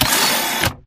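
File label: Stalker Movement Servo Head pivot Medium; Titanfall 2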